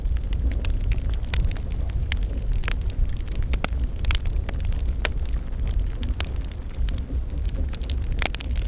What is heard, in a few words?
tank burning loop; tank breakdown; World of Tanks Blitz